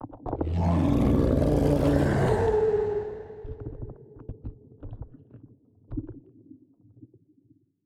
space dragon attack; Stellaris; reanimated

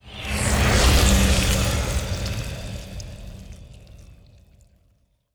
ant ship mauler move; biogenesis

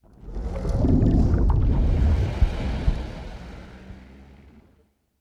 aquatics portrait machine

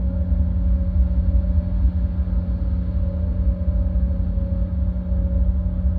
ship idle; Stellaris

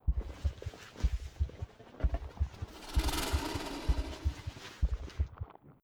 space dragon idle; Stellaris; guardians

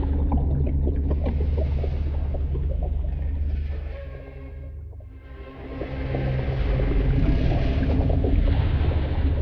void worms mature idle; Stellaris; grand archive; ships

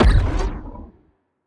laser hit, weapons; fire rate; Stellaris; biogenesis

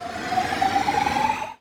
moving out corvette; Stellaris

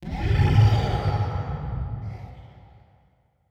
space whale; Stellaris

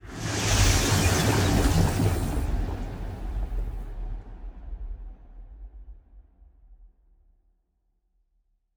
ship science ship move; Stellaris; machineage

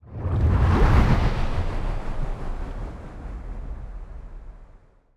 colony ship move; aquatics

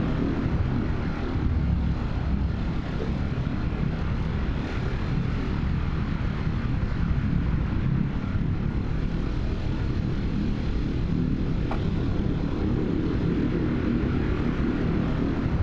ship juggernaut idle; Stellaris; machineage; ships

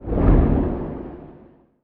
kaiju lightning hit whoosh; Stellaris; biogenesis; weapons

